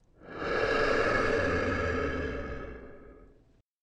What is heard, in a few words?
Exhale